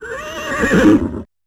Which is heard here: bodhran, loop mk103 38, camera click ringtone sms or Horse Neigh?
Horse Neigh